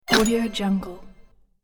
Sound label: ouch gremlin hit game